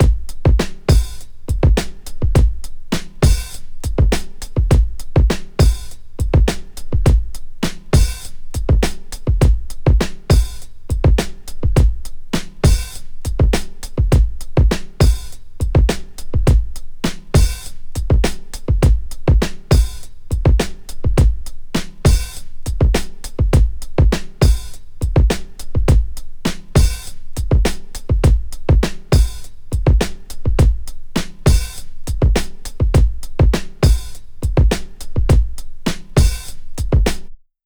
102 breaks; Pip's Fave; Lockdown Breaks

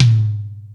Rock N Roll, E-MU Pro-Cussion

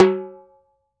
H-TIMBA OP, TIMBALES

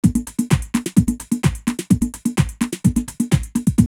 TeRminator 128, drumloops Rave; X-Static Goldmine; 90 s Sample CDs

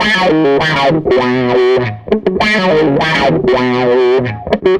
Tele Guitar Loop

